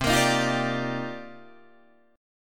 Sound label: C Minor 11th C Guitar DADGAD; Cm11 strummed; 10,13,13,10,10,12